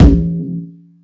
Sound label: Ooh Aah Kick, Kick One-Shots; KJ Sawka - Drum n Bass & Beyond; Drum Tool Box